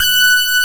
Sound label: SYNTHESIZER ANA LEAD; AKAI S6000 CD-ROM - Volume 1